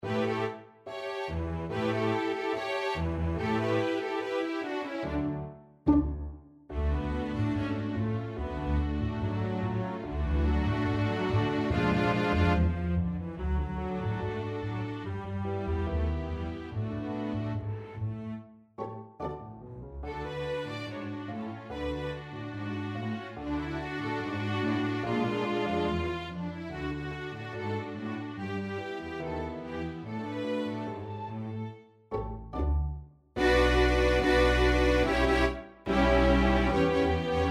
Two Tiny Tangos - Tango de Tijuana, Everything String